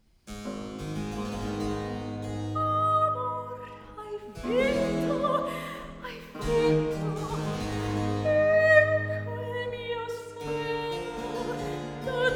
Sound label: Cantata - Recitativo; Rv 651 'Amor, hai vinto'; Antonio Vivaldi (1678 - 1741); Vivaldi - Concerti e cantate da camera II (2004)